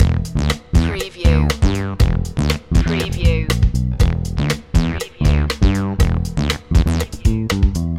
funky feet loop

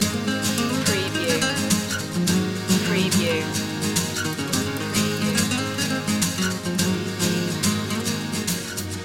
guitar slinger loop

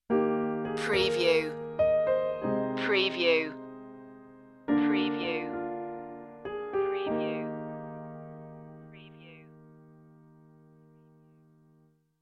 falling leaves stinger

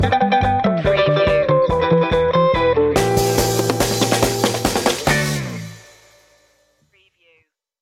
stomp stinger

